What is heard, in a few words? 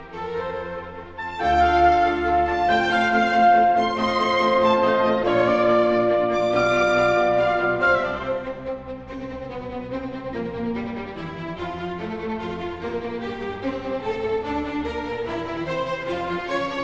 classical